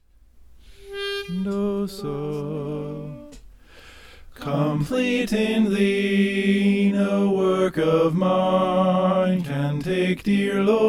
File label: soprano; complete in thee